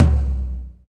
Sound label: TOM TOM, Roland